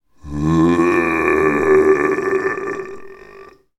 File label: horror monster zombie male groan